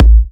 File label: A, kicks; markus hakala kicks